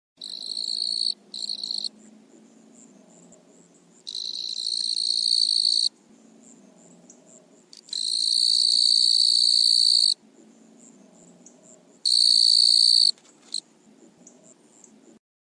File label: Crickets Warmup, nature sounds